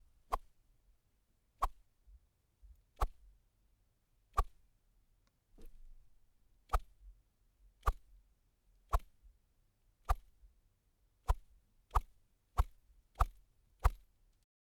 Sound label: Bamboo Woosh Fast